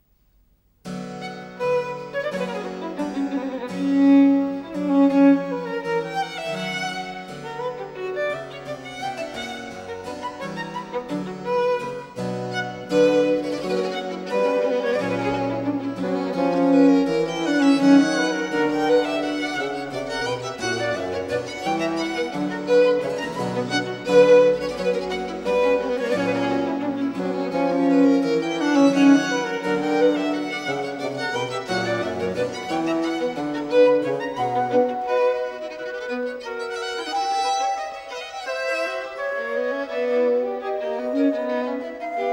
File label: Canon 2 a 2 Violini in Unisono; Bach; Musical Offering BWV 1079; Ensemble Sonne